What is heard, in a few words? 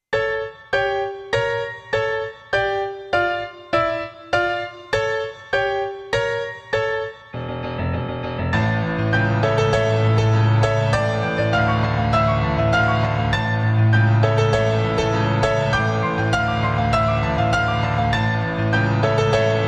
Piano Tutorial